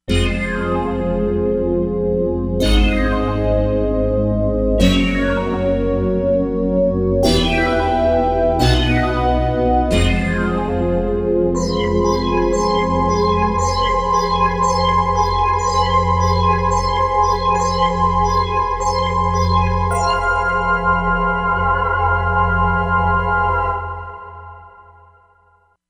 Glacier, Korg MSC-07S Synth combinations; Korg MSC-07S Synth 2

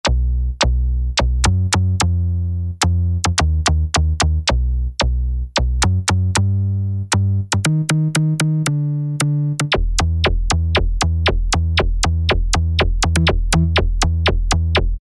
Bass Syn 2, E-mu Proteus-1; Presets

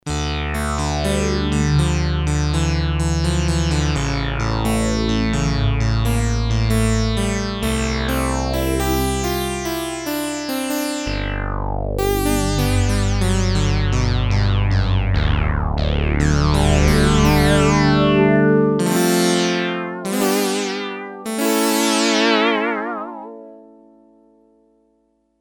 Synth A, Sequential Circuits Six-Trak; Factory Programs

